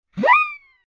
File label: Slow Throw; Bugs Bunny - Batter Up!